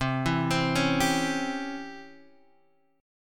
Db Minor Major 7th Flat 5th C

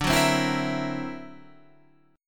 D Augmented 9th Guitar Standard, chord; 10,9,10,9,11,12; strummed; JGuitar

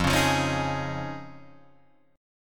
F Minor 7th Sharp 5th, Guitar, DADGAD

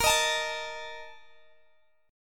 Bb Major 7th Suspended 4th, Guitar, Open G; BbM7sus4 strummed

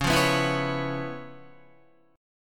C sharp 7th Sharp 9th Guitar, chord; strummed; C#7#9; JGuitar

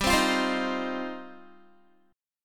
G 7th Suspended 4th Sharp 5th, Guitar

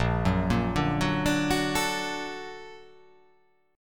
A sharp Augmented Major 7th A sharp, guitar

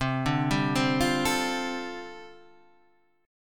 G 7th Suspended 2nd Suspended 4th C chord

